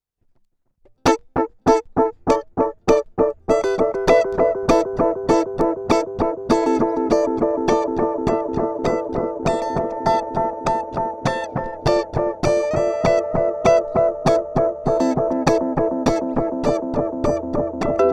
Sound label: DM 2w Standart Mode